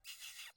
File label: mouse squeaks; angband